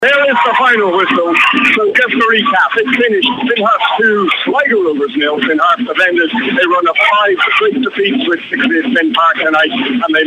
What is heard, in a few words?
HARPS